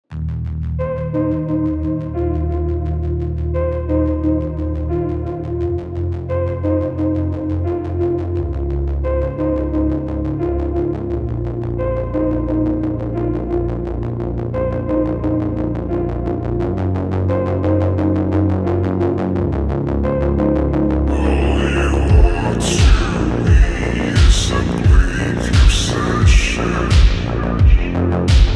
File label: slowed reverb